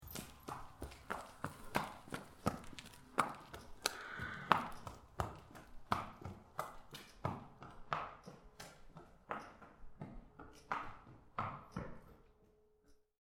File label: Horse Walking By On Cement Floor Indoors; Horse hooves clacking on cement floor